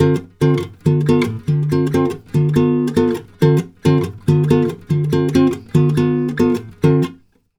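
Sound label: 140 guitar D minor 7, Brazil Bossa; USB Soundscan; AKAI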